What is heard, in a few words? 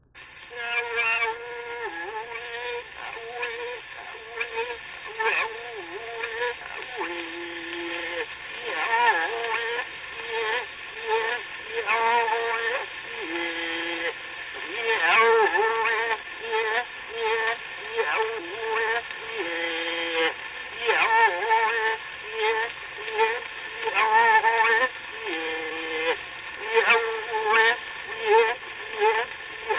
Name chant, Hawai'i; World Music; Hawaiian; for King Kalakaua; Na Leo Hawai'i Kahiko - Voices of Old Hawai'i